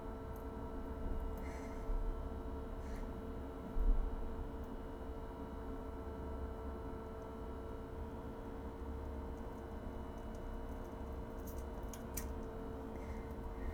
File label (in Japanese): 大、ミチ音ポチャ音吐息おしっこ音